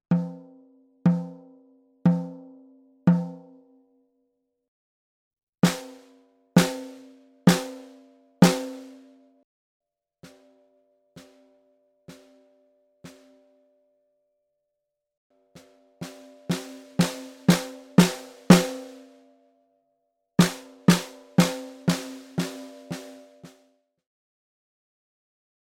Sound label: vintage mahogany koa low, drum